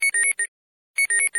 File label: Alert, notifications; Goophone Y5; iPhone 4S clone; Chinese clones